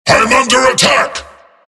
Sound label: pain; tf; skeleton king